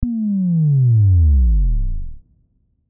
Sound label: drop sound effect